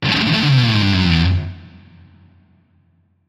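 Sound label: Electric Guitar Plectrum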